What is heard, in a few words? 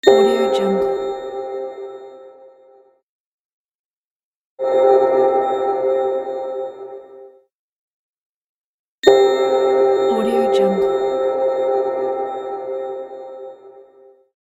impact magic bells